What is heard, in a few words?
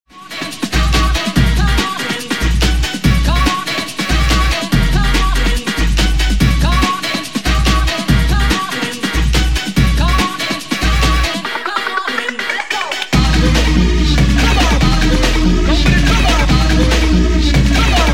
Bass